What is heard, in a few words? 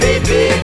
Beatle Beep Short w Band